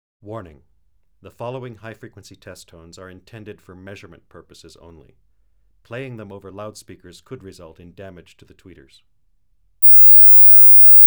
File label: 19 + 20KHZ at 0dBFS (L+R); Stereophile; Stereophile Test CD 2